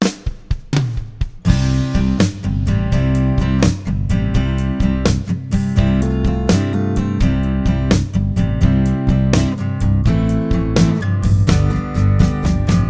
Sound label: Acoustic Shuffle